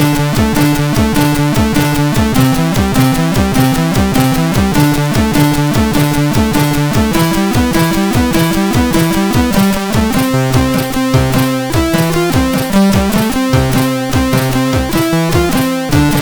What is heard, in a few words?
Music sid CSG8580R5; Sigi